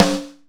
Acoustic Snare D Key

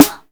dry snare sound D key

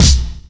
airy kick drum single hit b key

